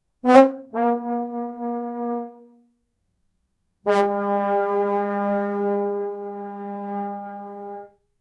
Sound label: a 40s cartoon trombone